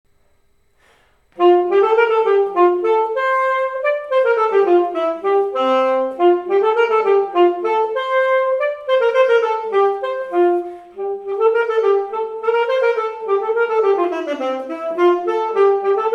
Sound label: Alto Sax Dance; 8th grade all state